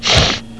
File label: sniff